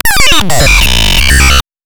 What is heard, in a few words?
Instrument Layer, bass